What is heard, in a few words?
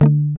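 GUI D, acoustic guitar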